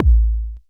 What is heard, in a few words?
Kick